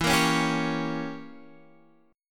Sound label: E Diminished 7th E, Guitar Standard; Edim7 strummed; JGuitar